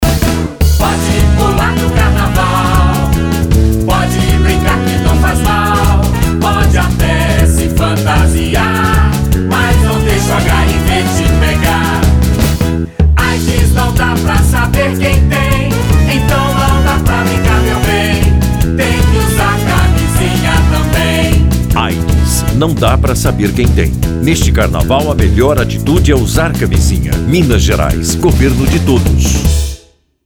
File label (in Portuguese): jingle aids carnaval